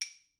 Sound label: claves mp; Idiophones; Struck Idiophones